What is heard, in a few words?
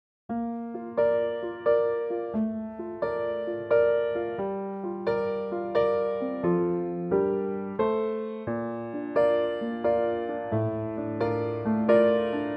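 Bb piano, male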